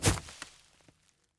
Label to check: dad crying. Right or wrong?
Wrong